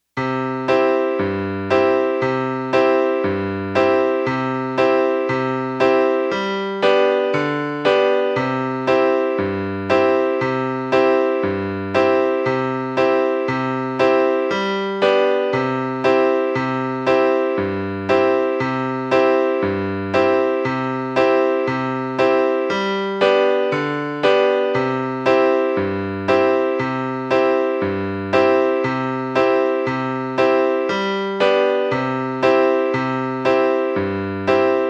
Turkey in C accomp.

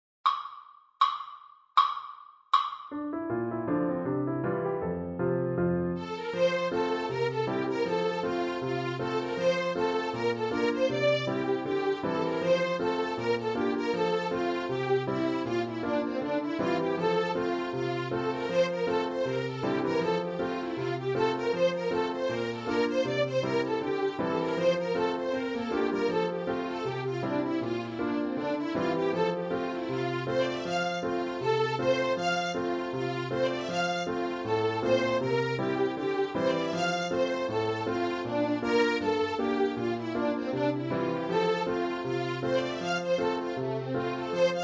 Cumberland Hornpipe 80

